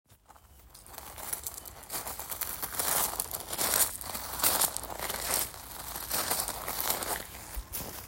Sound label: Steps